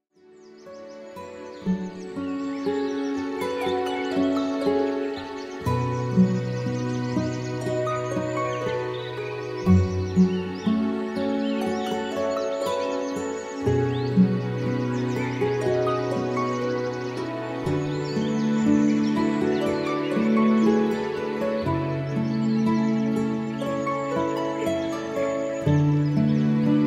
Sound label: Music with Birdsong